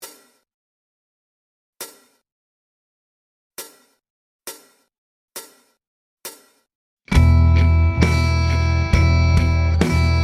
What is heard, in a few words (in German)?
E Gitarre slow